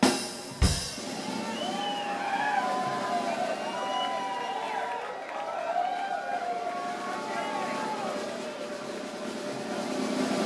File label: Drums; The Bluebird Nightclub Bloomington IN USA